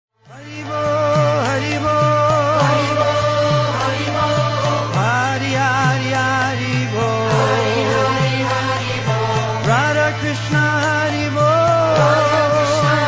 Hari Bol

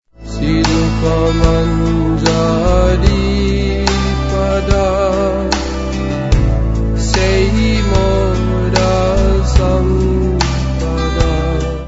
Electro Punk; Sri Rupa Manjari pada

